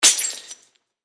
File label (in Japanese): お皿が割れる音 響きあり